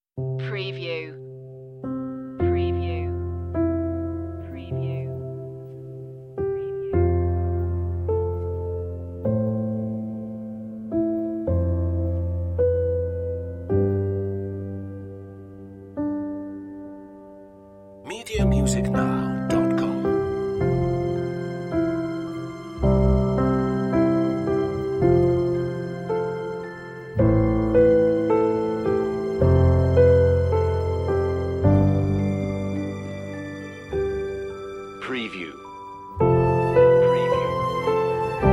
love is the key, ethereal

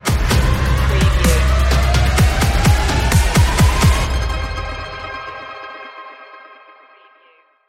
stinger; riser

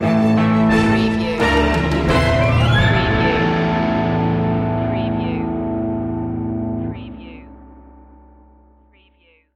stinger, Country Spaghetti Western; showdown

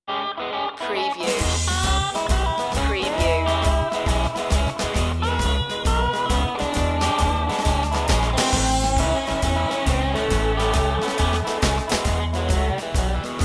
rough house blues, blues-rock

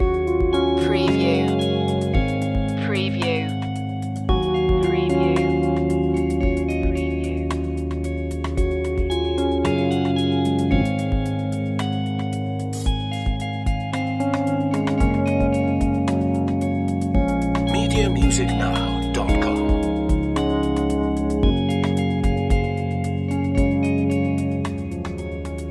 stirring spirits loop; romantic; nostalgic

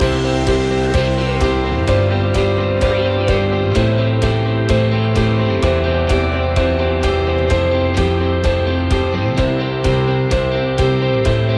loop, rock moderate

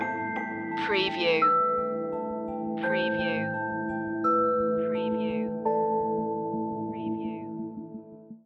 stinger, Ambient Atmospheric

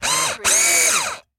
Electric Drill Tight; Sound Effects Bible

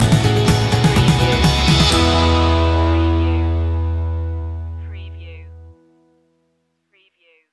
the skys the limit stinger; artist

